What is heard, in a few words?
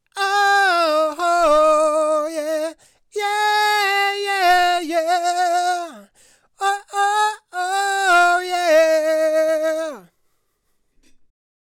Vocals Saturation